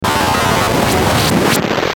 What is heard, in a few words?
Glitch Warp Digital Noise, Warble Swell Short; Production Element Imaging Element Accent Transition